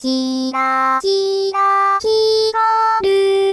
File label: synth test